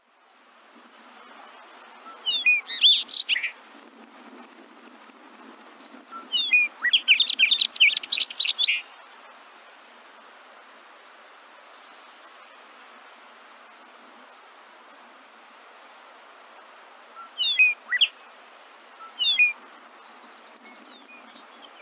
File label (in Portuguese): Canto do triste pia